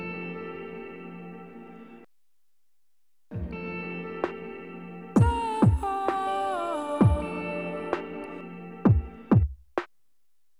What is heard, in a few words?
pipe; skate